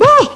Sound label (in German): huch